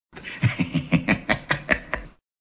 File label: Rhubarb Laugh